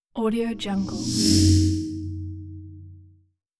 short soft whoosh by

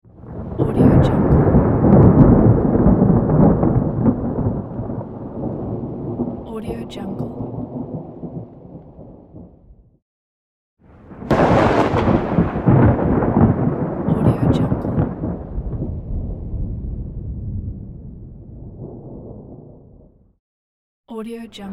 thunder and lightning

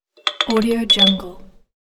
child toy chime clinck and clanks

